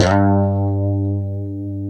G 2, Guitars; Spectrasonics; Hans Zimmer Guitars VOL-1; 90's Sample CDs; COMP POP+VIB

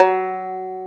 banjo F#3; AKAI S-Series CD-ROM Sound Library VOL-2

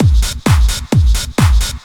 drum 130 techno; Techno Trance Essentials

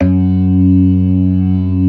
ORGAN, C3 PERC; Keith Emerson Signature Series

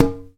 Tube Hi Open, drum machines

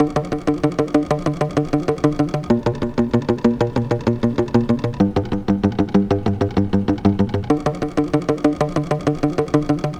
Steady Bass; Sonic Foundry (Sony Creative Software); Club Joints and Street Anthems; Toxic Rain

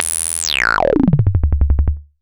Kaossilator popz

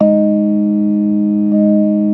B3 G#3; Best Service; Hyper Dance

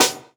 Snare, jungle breaks drum sounds

